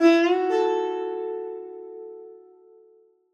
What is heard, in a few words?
action ethnic plugged indian strings; sonniss gdc